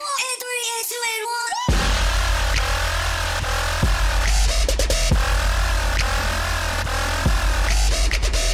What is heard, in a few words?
instant trap